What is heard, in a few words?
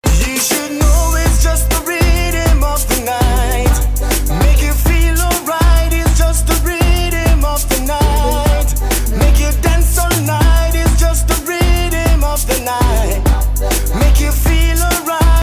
Rythm Snippet